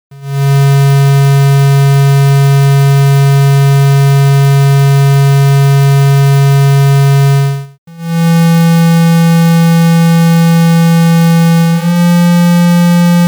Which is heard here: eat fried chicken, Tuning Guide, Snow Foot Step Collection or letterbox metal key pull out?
Tuning Guide